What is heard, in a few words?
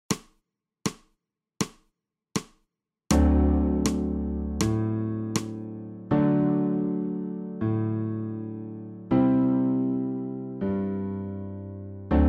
SOPRANO